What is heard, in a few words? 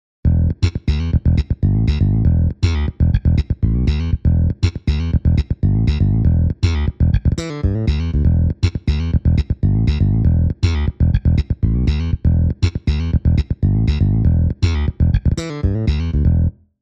Slap bass; montage; basses